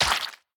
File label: Hit Bug; we are the dwarves gamerip 2016